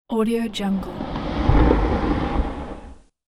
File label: stone door hidden passage